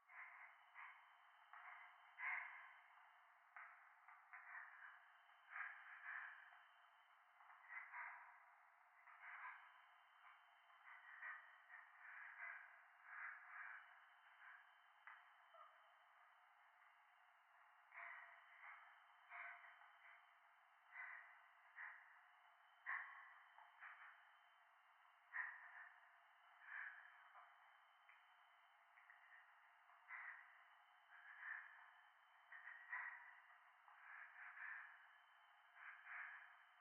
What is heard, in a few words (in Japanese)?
教会 左耳快感吐息 山奥